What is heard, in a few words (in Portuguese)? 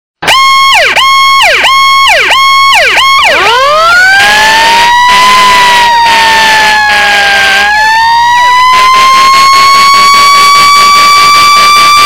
alarme buzina alta disparada